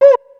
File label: Cuica, Ensoniq ASR-X Pro